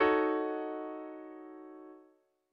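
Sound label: Eb m7 b5; ackordtabell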